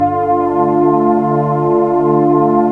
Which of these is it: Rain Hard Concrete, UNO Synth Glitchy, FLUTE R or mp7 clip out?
FLUTE R